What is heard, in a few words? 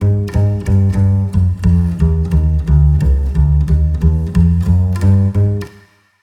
bass